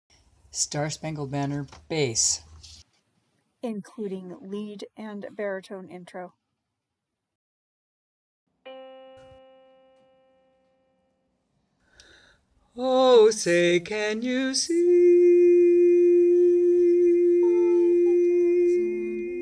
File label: Bass